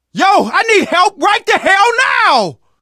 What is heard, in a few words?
dying, survivor voice, manager